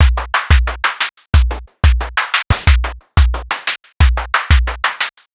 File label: Fx Loop